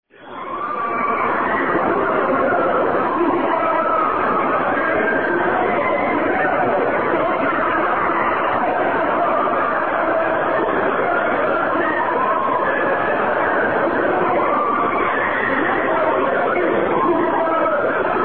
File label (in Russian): strashnye zvuki